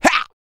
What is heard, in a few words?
yell, male human, R&B dance vocal; Spectrasonics Vocal Planet